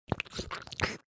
gecko lick; npc

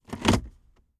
change gear, car

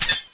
missile reload, ballista; tfa cso

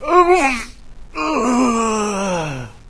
zombie death; doom3

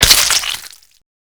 hornet explosion

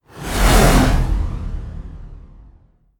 windup

